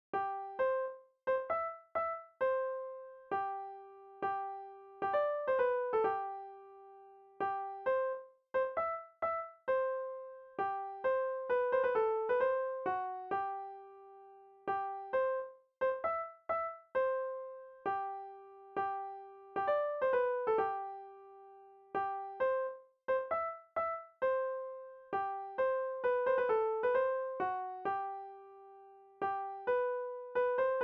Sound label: melody